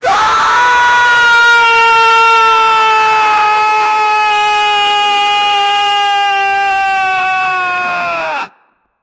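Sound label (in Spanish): grito masculino desgarrado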